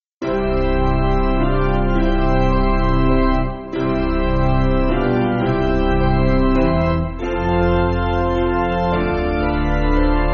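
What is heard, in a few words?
Piano, Backing Files for Churches; Thank You Lord Creator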